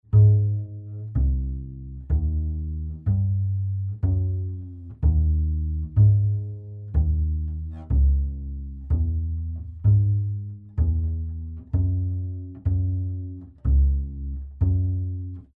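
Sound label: UPRIGHT BASS EQ BYPASS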